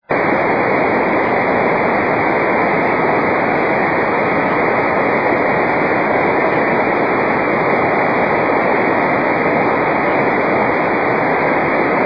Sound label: 10G jt65c airplane; Beacon